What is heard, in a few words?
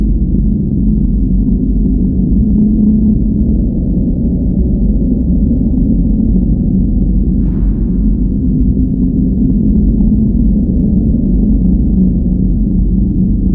underground rumble tone loop; ambient; Left 4 Dead 2